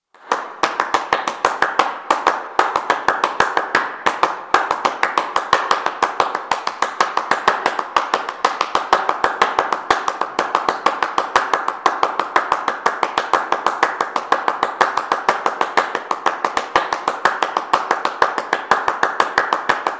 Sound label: clapping music